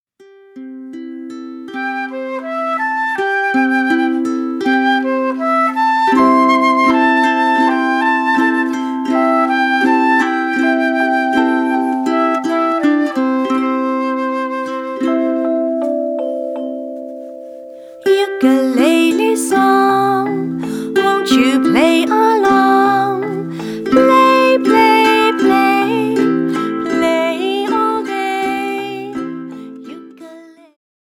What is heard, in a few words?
Ukulele Song